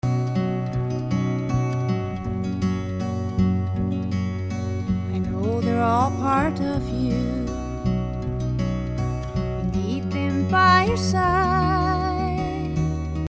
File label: Music In Style